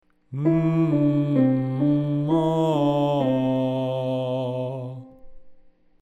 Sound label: Mmm Ahh